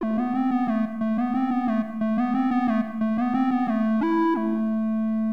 sq lead; HipHop; LiveAct Pool 1

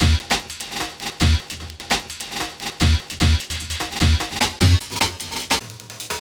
drum

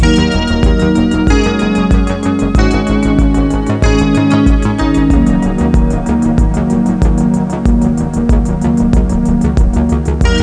Music

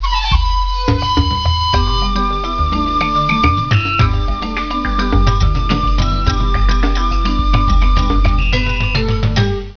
Theme; Macworld (1998-05); Games World; Triazzle 1.0 Demo

